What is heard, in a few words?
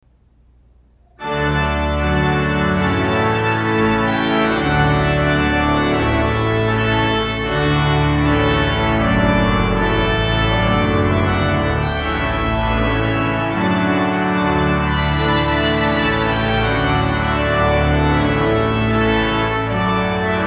Trumpet Tune; weddings at old st josephs